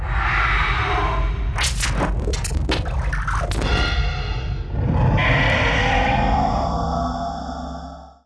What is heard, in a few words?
Leviathan die; Seer; GamigoNA